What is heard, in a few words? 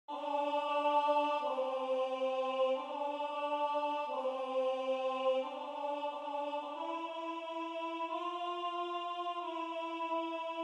Alto